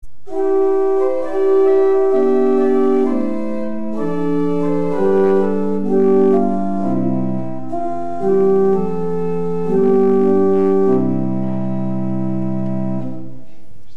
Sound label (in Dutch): orgel, Holpijp 8', Prestant 4'